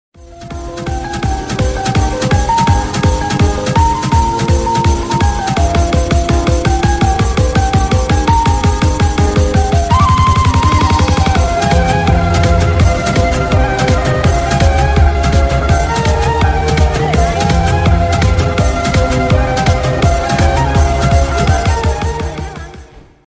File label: remix song ringtone love song ringtone tamil love song ringtone